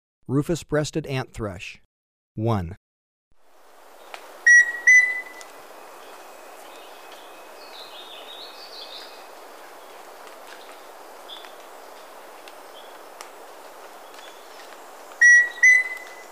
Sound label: Rufous-Breasted Antthrush; S. America